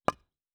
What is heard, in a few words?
Rock Impact; Survival Game Sounds - Lite Edition; Environment